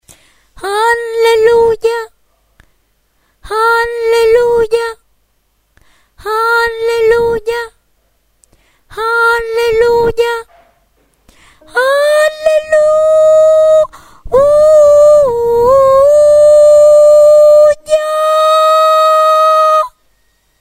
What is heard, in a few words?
tenor